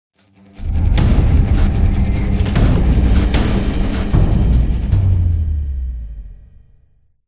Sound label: Continue Timer; Spider-Man Unlimited